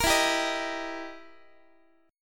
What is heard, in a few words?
E Minor Major 7th Flat 5th, E, down, Guitar; strummed; JGuitar